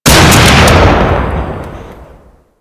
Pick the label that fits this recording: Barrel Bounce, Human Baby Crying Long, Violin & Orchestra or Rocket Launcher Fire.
Rocket Launcher Fire